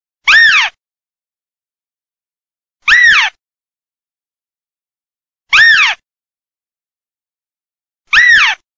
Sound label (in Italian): CAPRIOLO